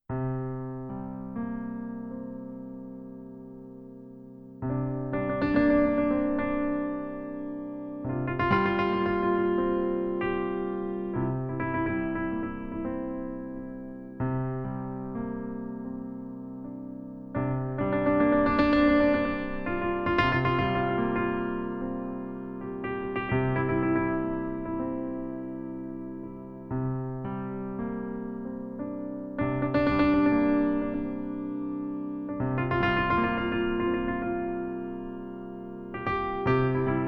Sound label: Piano Music